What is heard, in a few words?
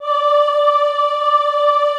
AHH D4, Classical Choir; Best Service; Extended Classical Choir